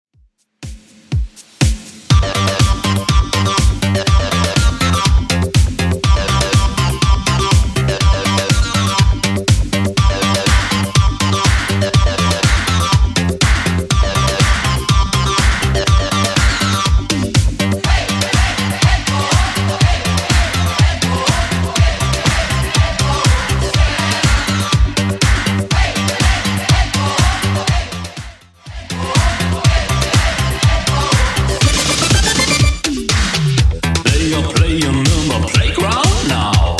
80s Redrum 122bpm

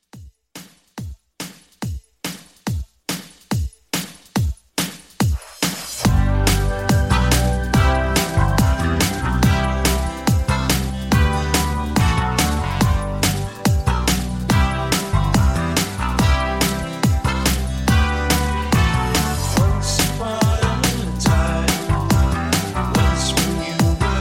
80s Pop Rock Redrum

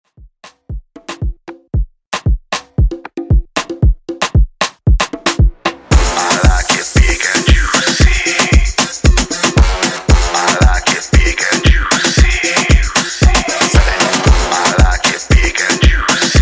Afrobeat 115Bpm

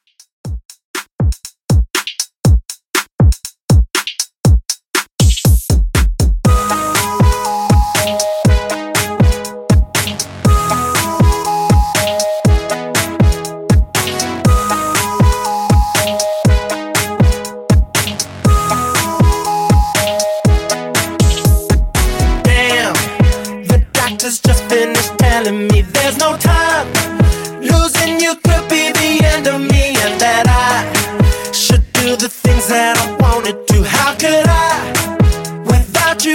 Redrum 120Bpm

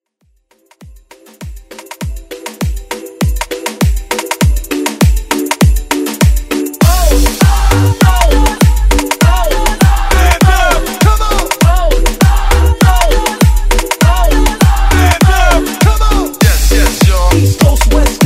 Xmas Moombah Hype

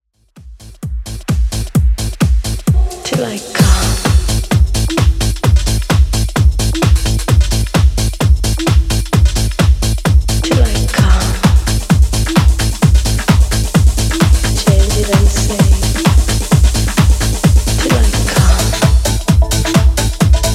90s Simple Redrum 130bpm

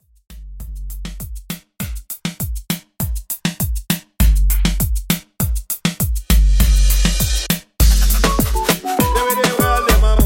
In Outro Moombah 100Bpm

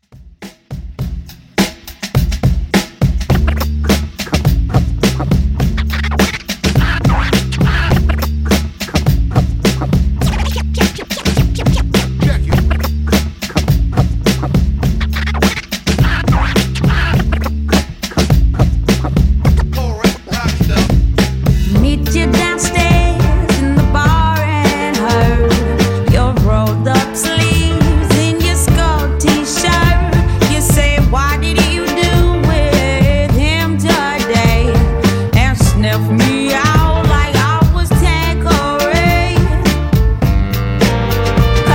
Scratch Dj Intro Outro 104Bpm

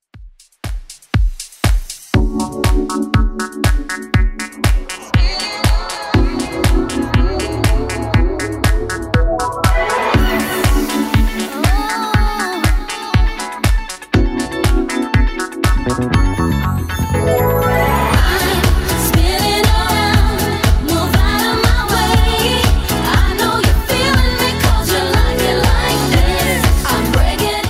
Dance, 120bpm; Redrum

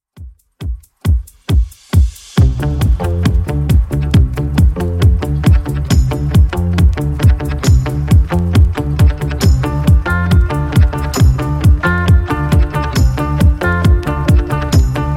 70s Redrum 136bpm

